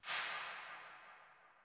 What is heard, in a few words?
CLAP, hi-tech kits; Crypton - DrumBase5000